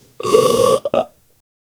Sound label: nervous gulp